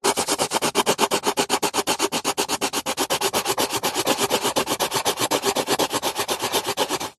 office watercolour pencil colouring in shading hard paper; zapsplat